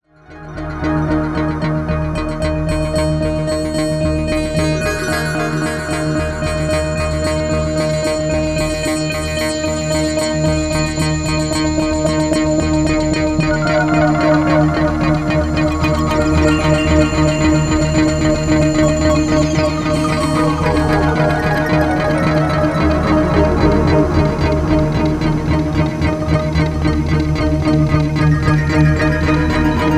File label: Hydrasynth Arpeggio